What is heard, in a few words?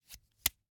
Hwatu, card game, hard plastic, small, clatter, pull, slap, throw down, single card, soft; Eiravaein Works; Vaeyan IV